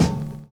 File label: OLD SNARE; Univers Sons